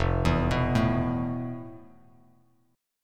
F Major Sharp 11th, Bass Standard; arpeggiated; JGuitar

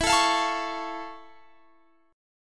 F Augmented Major 7th E

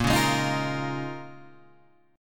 Bb Minor 11th Bb down Guitar Open G

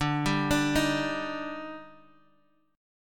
Eb Major 7th D

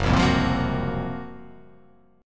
F sharp Major 7th Flat 5th

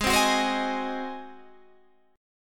G sharp 7th G sharp, Guitar Drop D

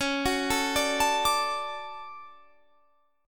D Major C sharp, guitar

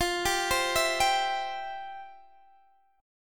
F Major 7th Suspended 2nd F, Guitar Open D

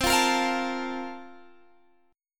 A sharp Major 7th Suspended 2nd

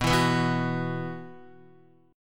B Suspended 4th, Guitar; Bsus4 strummed

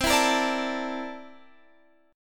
C 13th C down Guitar Open F; C13 strummed

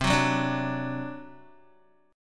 C Suspended 2nd Flat 5th C down Guitar; Csus2b5; strummed; JGuitar

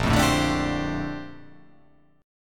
A sharp Major 13th A sharp

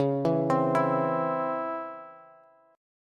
C sharp Minor Major 7th C sharp Bass; C#mM7 arpeggiated; JGuitar

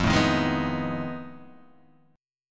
F 7th Sharp 9th Flat 5th, Guitar, Open D

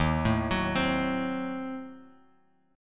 Eb Diminished 7th Bass Standard, Ebdim7 chord; Ebdim7 arpeggiated; 11,12,16,17; JGuitar

